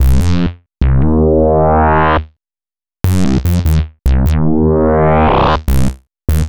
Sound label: Synth Bass